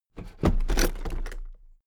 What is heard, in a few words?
toolbox open heavy